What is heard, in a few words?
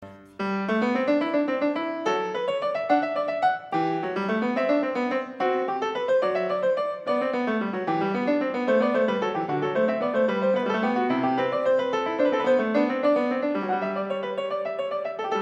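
Goldberg Variations, Canone alla Nona, piano; a 2 Clav; Bach; 1982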